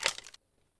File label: mp5 safety